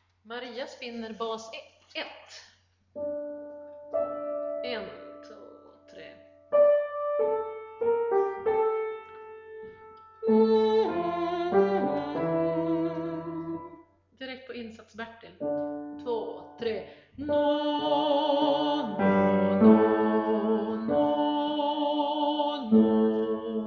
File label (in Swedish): Maria spinner bas